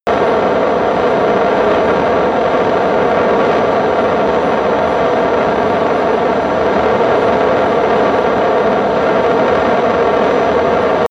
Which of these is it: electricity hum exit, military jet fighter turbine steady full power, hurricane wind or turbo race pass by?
military jet fighter turbine steady full power